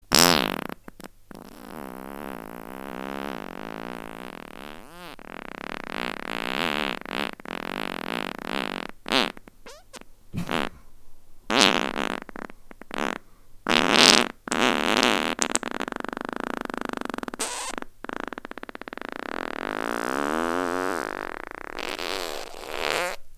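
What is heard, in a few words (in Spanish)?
pedos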